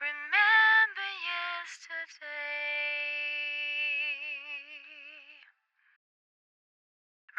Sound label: On A Star 65bpm F, Vocal; Zero-G